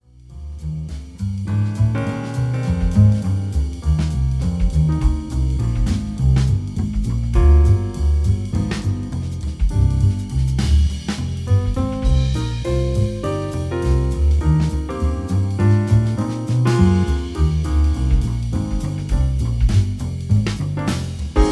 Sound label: jazz trio